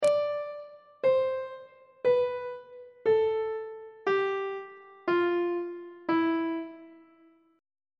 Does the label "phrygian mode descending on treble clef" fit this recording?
Yes